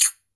ZILIES CLOSE

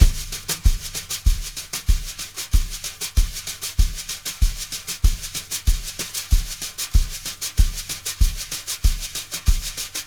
BRUSH LOOPS, 95 BRUSHIN

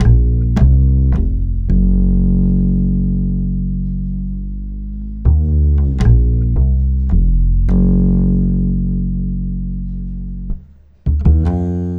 Acoustic Bass

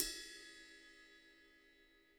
L RIDE 2 BEL 2, Interactive Drum Kits; Sonic Reality

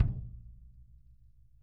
KICK, Drum Kits

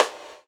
Gate Rim, Drums Acoustic; ALL RIM; USB Soundscan vol.10; AKAI; 90s sample CD

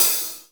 HAT SLOSH

